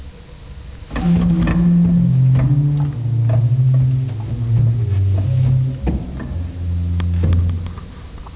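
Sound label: Floetbass 8, organ; Vitus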